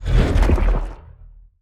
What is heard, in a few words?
crystal magic away